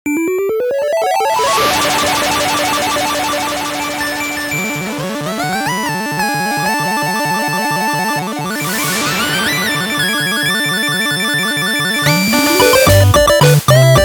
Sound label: 8-Bit; The Best Place For Me